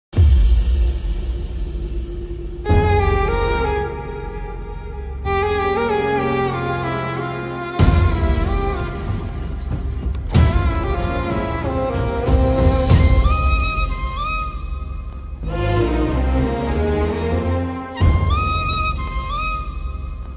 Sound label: emotional bgm; waltair veerayya